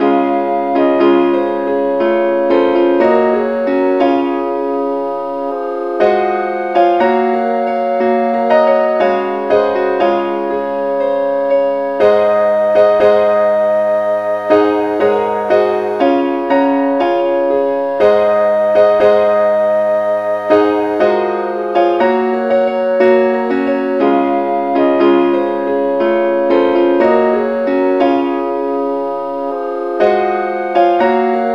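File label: keyboard key F sharp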